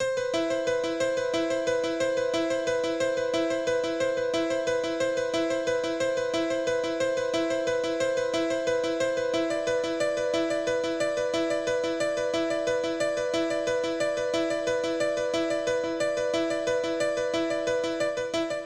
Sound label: Dance Piano; super crush k.o.